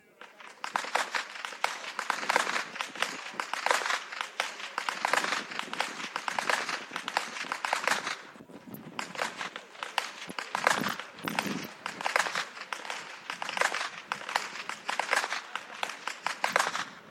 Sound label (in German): schnalzen, jugend; tittmoning; waging